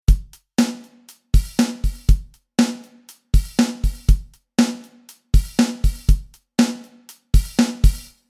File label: Maroon Drums